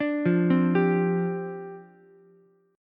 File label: D Minor 11th D down Ukulele C6; Dm11 arpeggiated; JGuitar